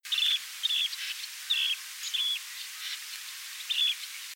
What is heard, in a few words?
Dunlin, Alpenstrandläufer